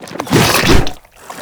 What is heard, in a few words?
Infested Ancient Strike Flesh; Warframe